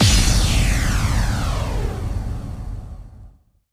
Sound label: big Win Start; netent; fruitshop